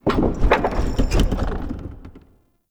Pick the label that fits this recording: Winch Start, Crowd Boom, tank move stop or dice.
Winch Start